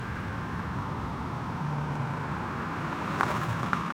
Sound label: car skidding on asphalt; brake